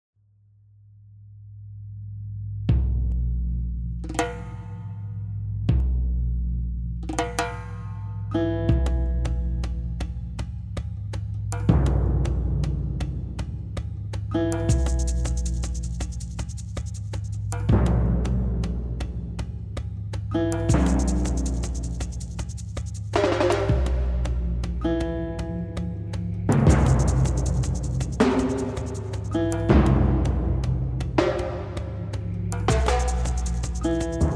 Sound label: Instrumental; Nobel Chor 2012